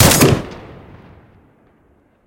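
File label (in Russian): звон снаряда выпущенного из ракетницы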